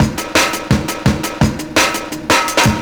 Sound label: Beat, Drum N Bass; Sonic Foundry (Sony Creative Software); Knutrix Drum & Bass